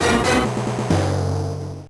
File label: Unused Fanfare; Brave Battle Saga; Genesis